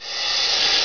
(C6) Reverse Cymbal; Flashback

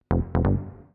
BASS SHOT HOUSE 125 A; eJay; Singleshots

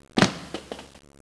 helmet, fire; disaster

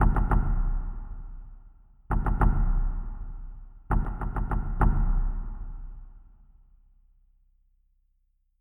Taiko

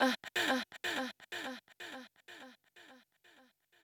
VOICE GRL HOUSE 125

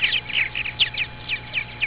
peach default beep; 250 Desktop Themes

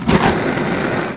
fire, Darts; DevilDarts 2.0 FAT

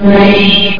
SHHH; PCMania; biobomb

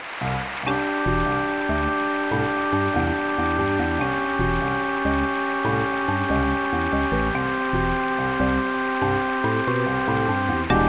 perc org; Massive MIDI Collection

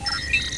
whirl dink; startrek

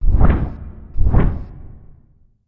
wings; Earth and Beyond; EA Games

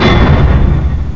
CLANK!

